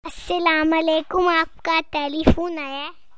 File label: Cute Baby Tune